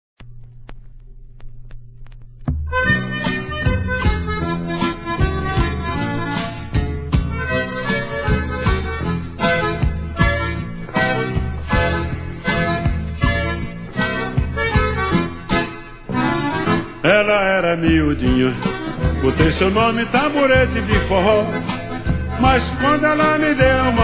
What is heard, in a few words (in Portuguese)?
tamborete de forro